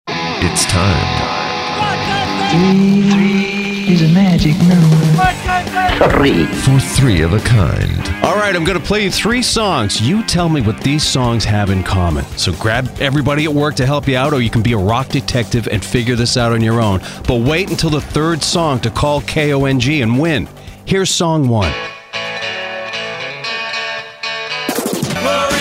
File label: Classic Rock, Male